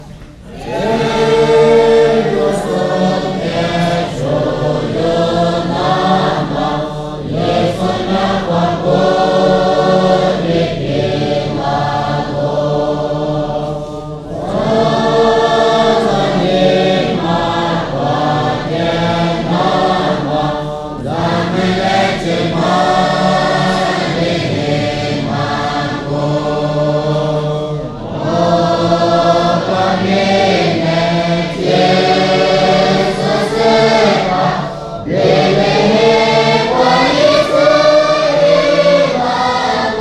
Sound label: Lisu deck the halls; 294 he came to save us